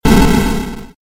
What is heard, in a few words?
game explosion; arms